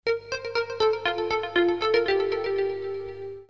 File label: koto